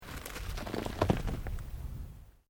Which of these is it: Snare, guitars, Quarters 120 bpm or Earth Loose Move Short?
Earth Loose Move Short